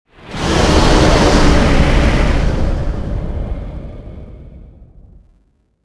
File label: vacuum